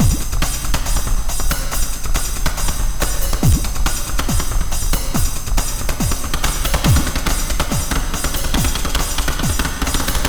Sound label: beat 140